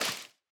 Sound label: step wet grass; Minecraft